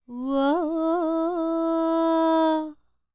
World Voices, INDIA; ILIO; Vocal Planet

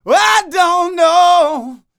ROCK, MALE HUMAN; ILIO; Vocal Planet

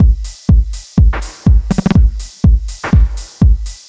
123BPM DRUM, Drum Loops

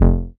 bass F1, ARP Odyssey

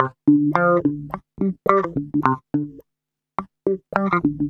WAH, WAH WAH GTR

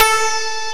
SYN, SYNTHESIZER; DIGI WAVE #1

